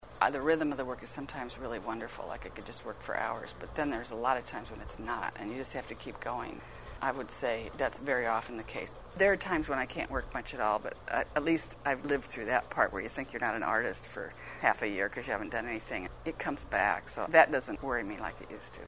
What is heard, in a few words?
rhythm